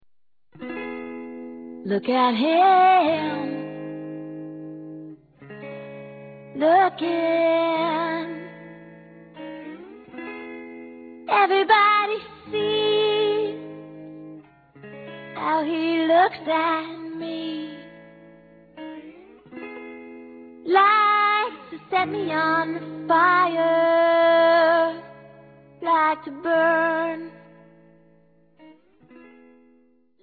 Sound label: swoon